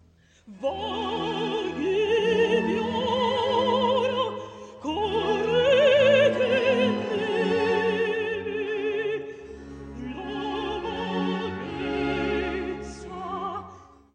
Accompaniment; verdi

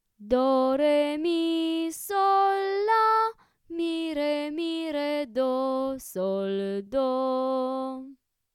solfa melody

